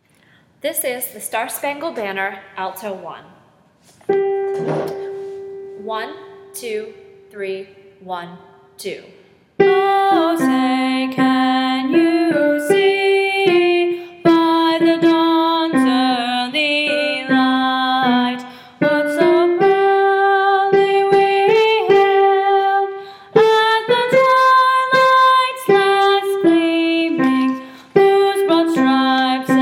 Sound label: alto